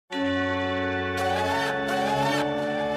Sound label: church tone